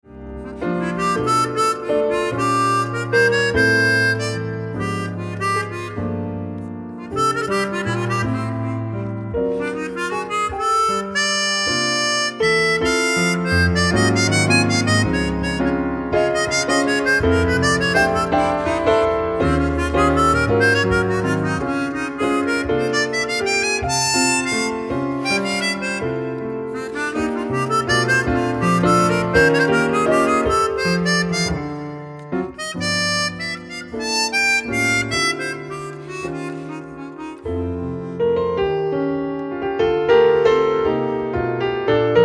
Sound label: Waltz For F